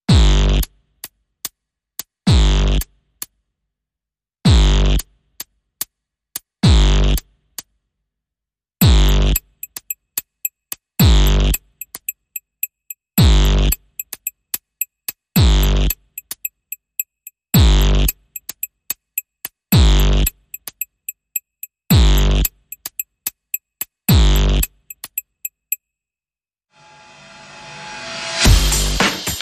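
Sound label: Percussion Only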